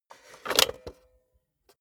cassette player slide tray insert soft

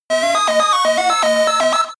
game arcade race futuristic

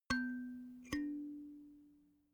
notification kalimba third up

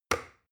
plastic button start push heavy

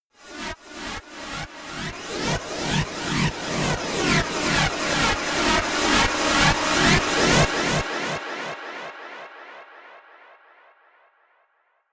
electronic rhythmic rising

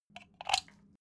socket pull plug

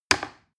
plastic button little click heavy fast

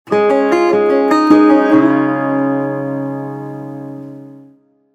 country road

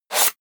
blade sheathe small

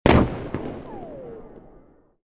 explosion

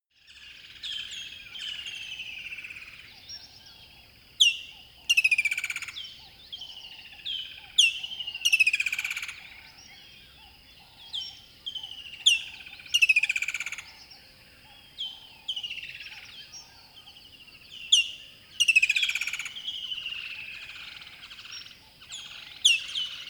river bed dawn bird call close

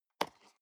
plastic box food small open soft

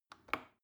plastic button small push regular

